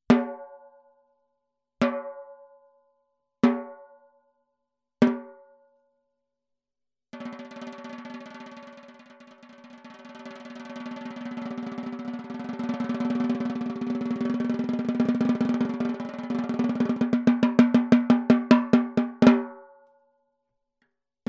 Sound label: PEARL MAPLE 12X7, CAISSES CLAIRES